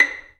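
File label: Vio pizzicato B5; Digital Sound Factory Virtuoso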